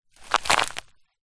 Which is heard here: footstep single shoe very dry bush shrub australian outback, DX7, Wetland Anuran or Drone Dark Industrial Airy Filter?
footstep single shoe very dry bush shrub australian outback